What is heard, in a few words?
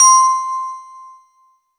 Yamaha CS2x Bell C7